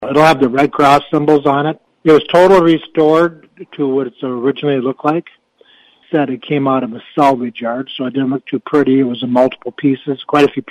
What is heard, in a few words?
Huey Helicopter; Showroom